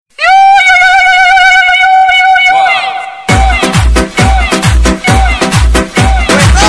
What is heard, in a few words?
"oooooooooooooooo"